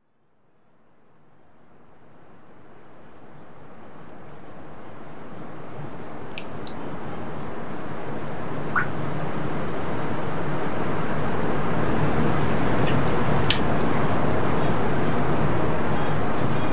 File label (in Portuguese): Nascente Furna Galinhas Selvagens